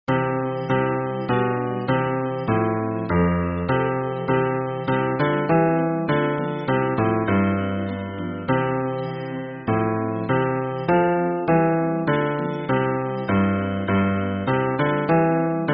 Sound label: hymn bass